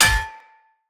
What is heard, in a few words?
Hammer Impact Parry; Weapon Impacts; Player; Combat; Tuvalkane Hammer